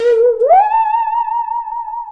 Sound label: saw danger, music, zombat